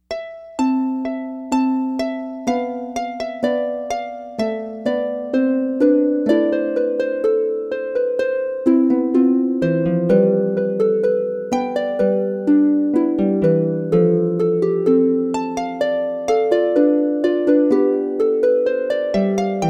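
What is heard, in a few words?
Gavotte, solo lever harp; Bach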